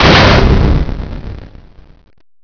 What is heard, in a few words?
dropdown